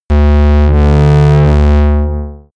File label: shot buzzy wave, games zaps buzzes